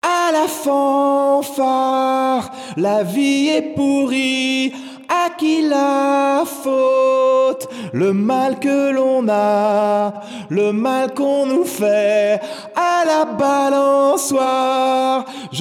junior song a cappella

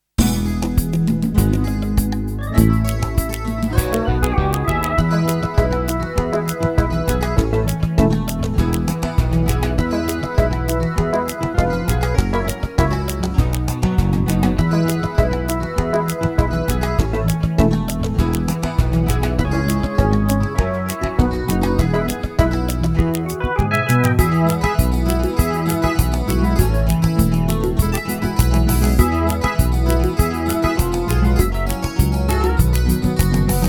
TRAIN COUNTRY

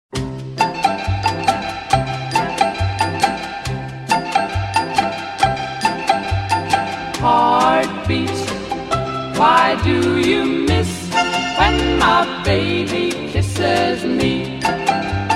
Heartbeat

